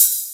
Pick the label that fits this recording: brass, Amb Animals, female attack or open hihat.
open hihat